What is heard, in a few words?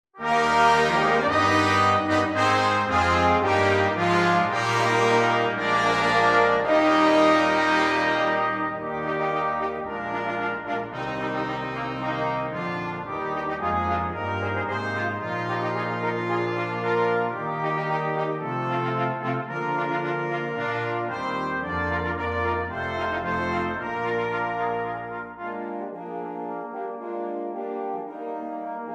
o canada ensemble